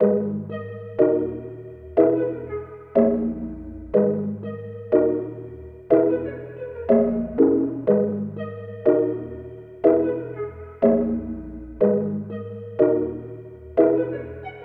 122 BPM G# Min, Melody; Class; Cymatics; 2022 Melody USB Expansion

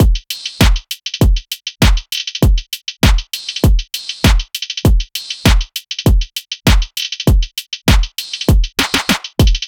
Phonk Kit 99bpm Full, Drums; EDM Drums; Drum Kits; Stems; Ghosthack; Drum Mega Bundle Demo; Ultimate EDM Drums; Phonk Kit 03

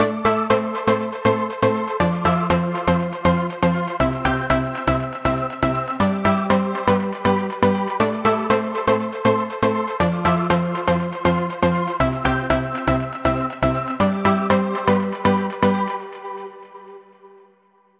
Synth Am 120Bpm, House; Musical Loops; House Kit; Ghosthack; Advent Calendar

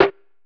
Lofi Snare

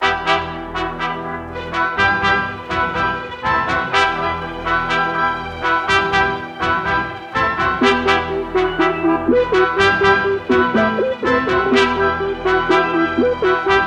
123 BPM D Min, Brass; Cymatics; Destiny Premium Melodies (BETA); Destiny - BETA PACK

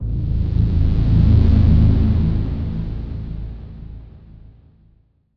Dark - Am 87Bpm; FX; Construction Kits; STEMS; Ghosthack; SkyRealm; Kit 18 - Shivering Sea

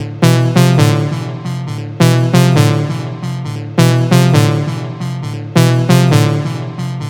Synth Loop E 135, Techno; Ghosthack; AC2024 - Day 1 - Techno Sounds 2025

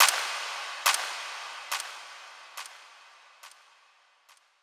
FX Shot - 140 BPM, Hip Hop; FX Collection

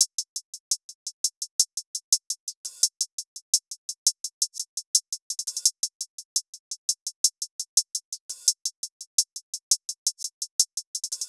Hihat Loop 170 BPM; Miami; Cymatics; Fiji Hihat Loops & Percussion

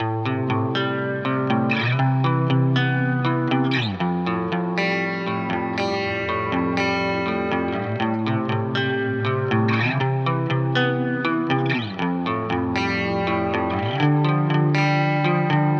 120 BPM A Min, Guitar; Grief; Cymatics; Miami Guitar Pack - Pt 1

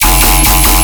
Bass Hit F, Dubstep; Necrotic Surge; Ghosthack; Demolition

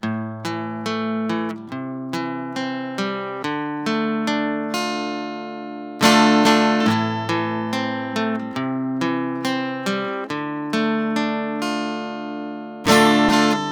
Guitar 140 BPM Amin, Guitar Loops; Weighted Shoulders; GhostHack; Ghosthack Advent Calendar 2021 - Day 10 - Guitar Loops and One-Shots

